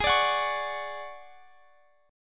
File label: D Major 7th Flat 5th, A flat